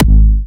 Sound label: Harder G#4, Kicks, Big Room Kicks